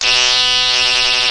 squirty analog, Synth

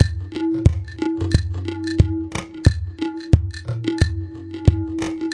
090 superstereo clav perc

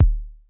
iELECTRIBE kick; Kicks; single hits

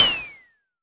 silly metal tom

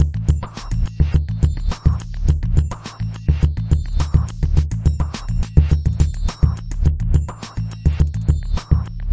105 plang dub loop; 101 to 110 bpm

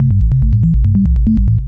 142 way too fast synthbass

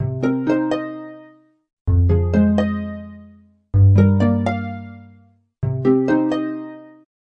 128 happy plucked melody; SAMPLED MUSIC LOOPS

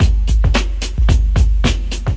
dumpty dump hop, 110 bpm, drum loop; 101 to 110 bpm

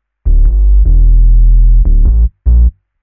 moody synth bass

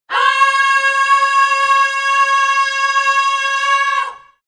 low voices male, Male Oh

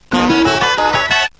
bebop horns

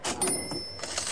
cash register; Sound FX Real Life

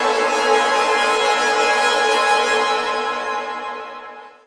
horror strings stab; single samples; Orchestral

